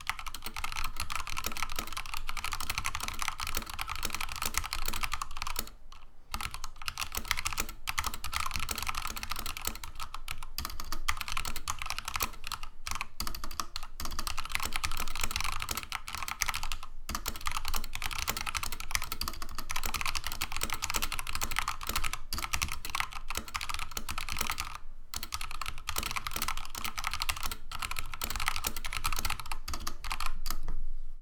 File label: Corsair K70 Mini Wireless Pro